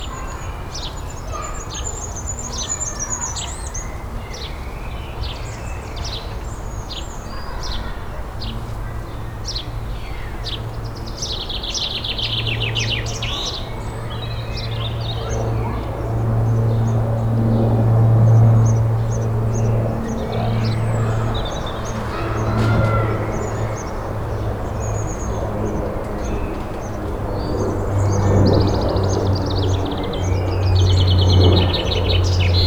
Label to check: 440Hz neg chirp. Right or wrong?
Wrong